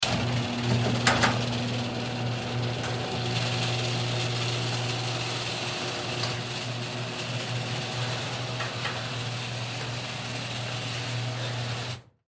Household Garage Door Open Electric Motor Automatic; Garage Doors; Blastwave